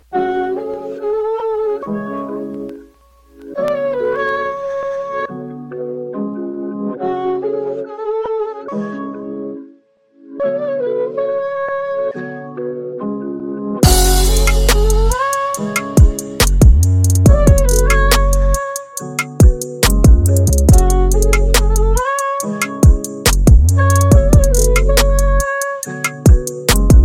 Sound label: Instrumental Rap Hip Hop Beats Sad Trap